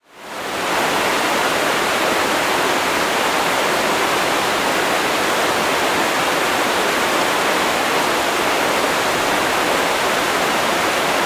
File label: Zion River